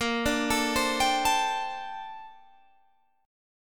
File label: A sharp Major 13th, Guitar Standard; arpeggiated; JGuitar